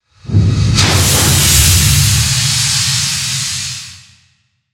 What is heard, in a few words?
Processed Air, Processed, Air, Discharge, Science Fiction; 5.1